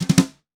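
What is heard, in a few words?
Black Brass 3 Note Brush Roll, Snares; Drums; Ghosthack - Free Snares 2023; Blk Brass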